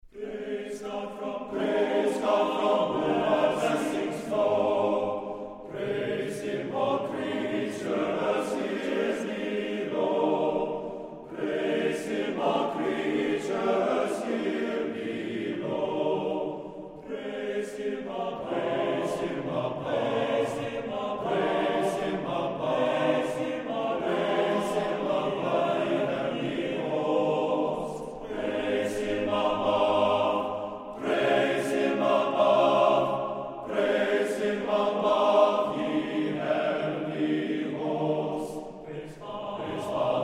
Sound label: Mens Choir